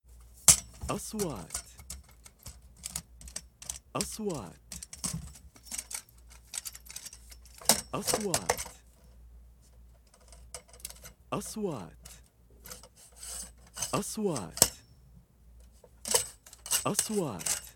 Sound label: stand lighting stand